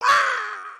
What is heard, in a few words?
Parrot Squawk; creatures organic